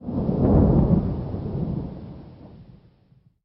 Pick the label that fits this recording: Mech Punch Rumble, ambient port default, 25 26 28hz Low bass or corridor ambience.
Mech Punch Rumble